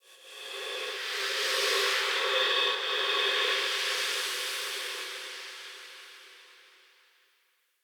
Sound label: Spirit Fly, Ambience; Soul Plane; Orlick